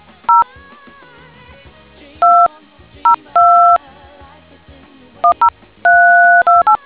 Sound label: dtmf